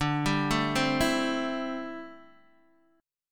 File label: D 9th Suspended 4th D down Guitar DADGAD